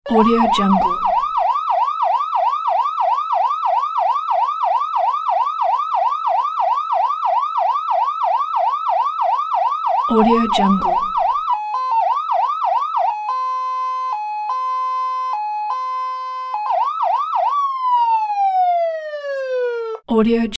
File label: fire boat siren; sound-ideas